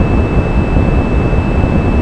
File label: flight; tacscan